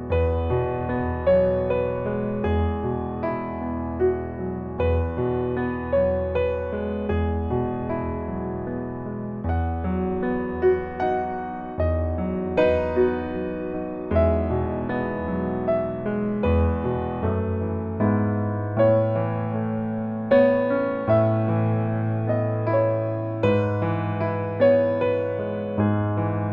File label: silent night piano; christmas